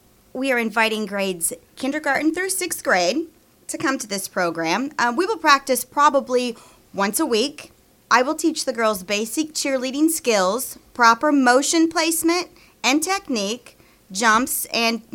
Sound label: CHEER, youth cheer; Washington youth cheer